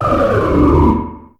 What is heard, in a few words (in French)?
Cri Banc